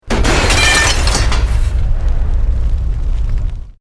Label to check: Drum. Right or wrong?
Wrong